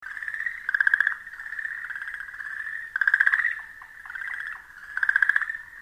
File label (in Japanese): okinawaaogaeru